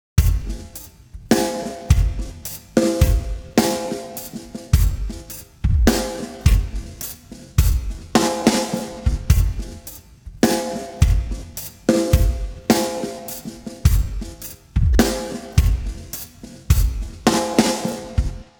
drums; apple logic pro percussive; timestretch

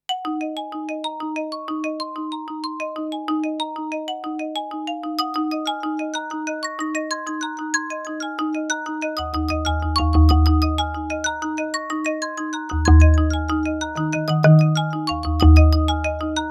perc pitched; kurzweil pc361

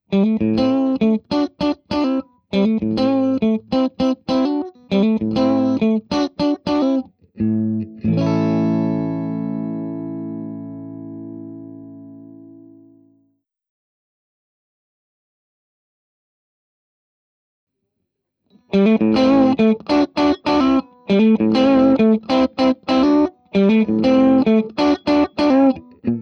clean re20 boost; randall mts

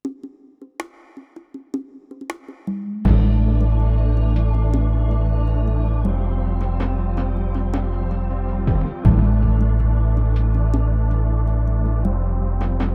style ballad chillout caf, yamaha tyros3

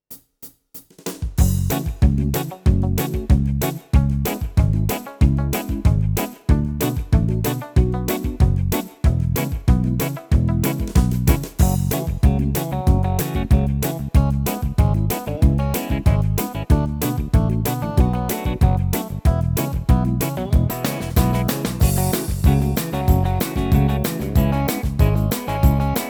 style r and b skiffle, yamaha tyros3

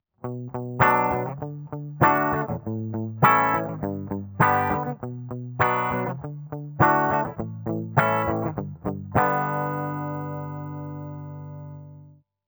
clean bridge; custom77 cs4

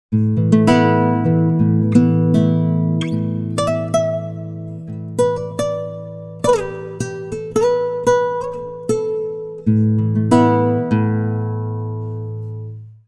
guitar, timestretch

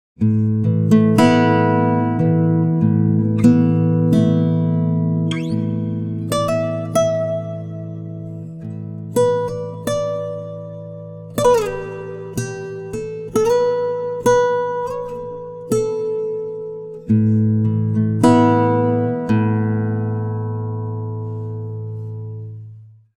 guitar, timestretch; prosoniq time factory clear scale

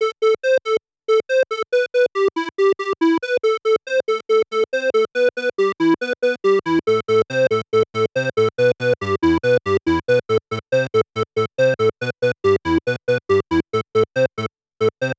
mopho add sub square pwm; davesmith mopho

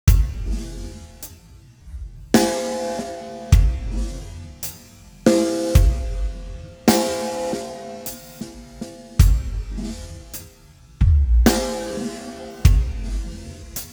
drums, timestretch; celemony melodyne

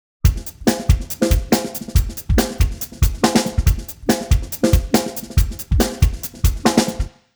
drums; prosoniq time factory dirac; timestretch

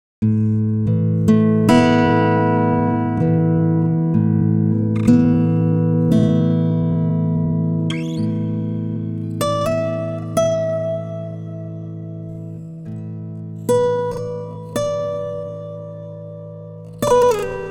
timestretch guitar; digidesign pro tools ts

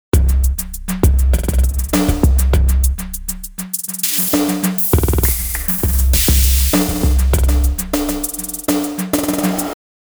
looper basic; sugar bytes; effectrix

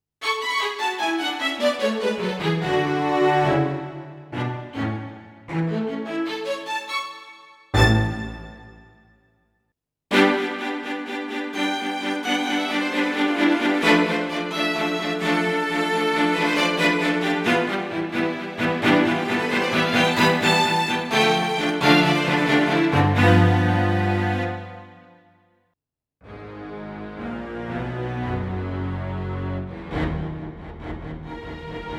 strings ens; kurzweil pc361

